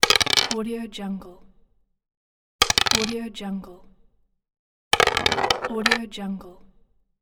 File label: dice roll